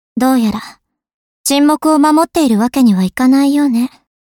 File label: bat; combats